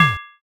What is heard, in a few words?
plate set